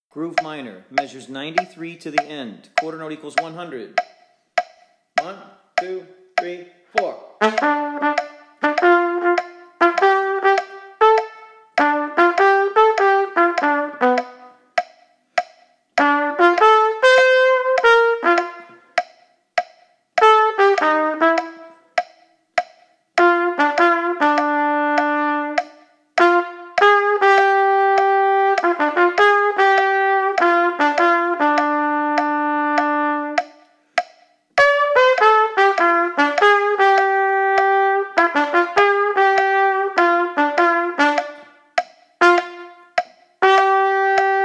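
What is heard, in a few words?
Groove Minor, quarter note=100; Play-along; trumpet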